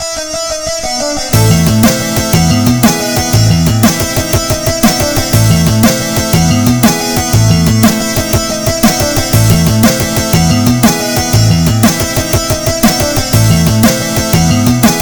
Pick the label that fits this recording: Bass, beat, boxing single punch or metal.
metal